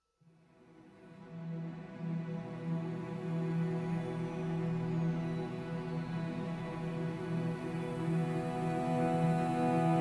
The Morning Fog - Plaintive Solo Cello

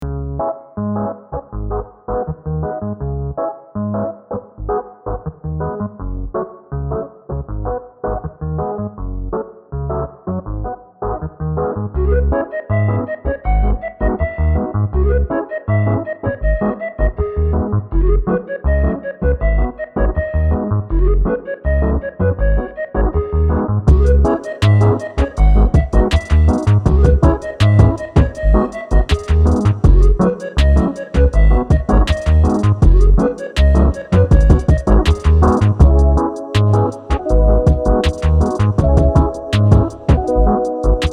161 C#m Samba

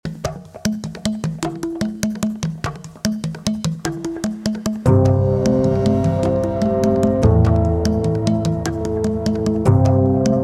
Percussion, Bass Chords

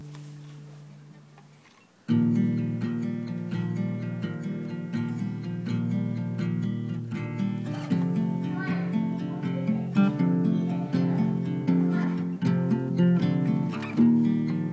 Pretty Guitar

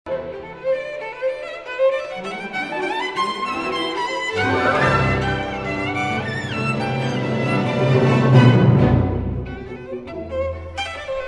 Paul Patterson Violin Concerto no2 Mvt1

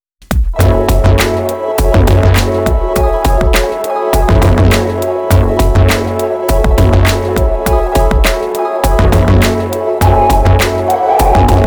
Beats Grooves Hip Hop Instrumentals

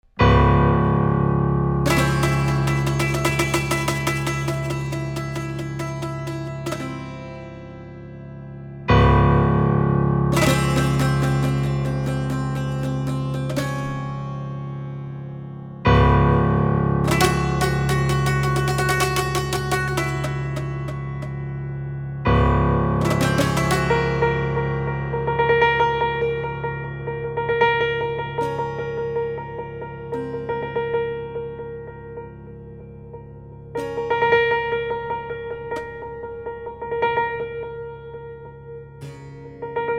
Piano Soliloquy

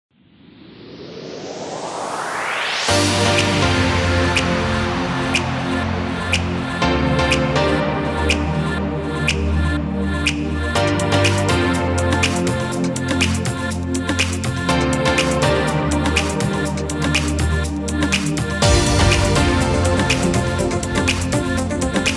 Upbeat

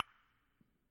CERAMIC BOWLS, BALLROOM

